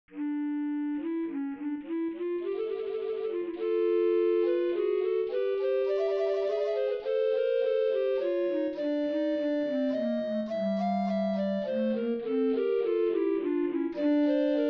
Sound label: Fuga Canonica